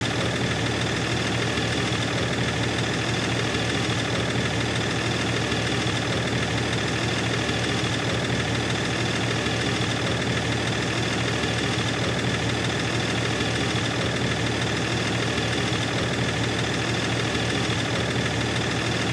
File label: engine; Stud-Rider